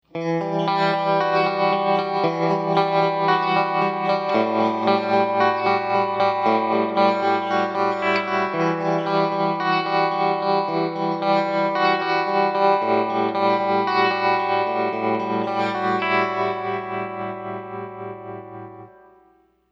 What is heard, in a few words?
Clean Variation, Spider II